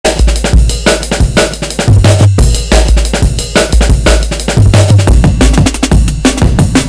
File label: Drum and Bass Jungle Old Skool, UK Bass Radio